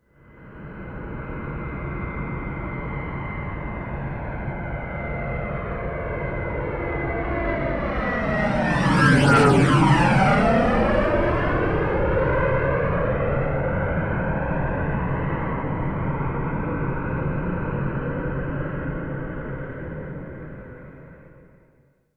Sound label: plane boeing 777 flyby direct front; flugzeuge verkehrsflugzeuge; verkehr transport